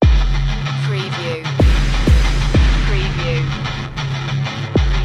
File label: bang the bass loop